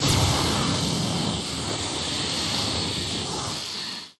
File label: laser